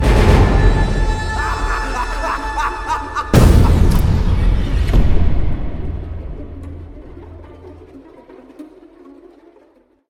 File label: Jump; Isac